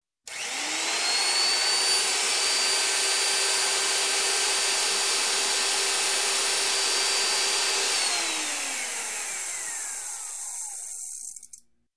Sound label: Electric Cutter Rolling